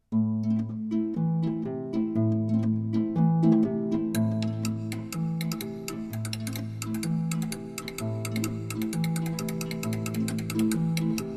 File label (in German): Zigeuner Faschingsmarsch; Maxglaner-Zigeuner-Faschingsmarsch; Harfe & Hackbrett